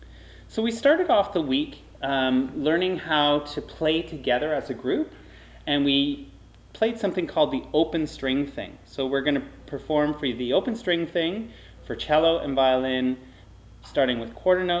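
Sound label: Open String Thing, Strings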